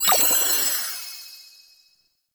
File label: Vendor UI Open; Warframe; Nokko Colony; Vendors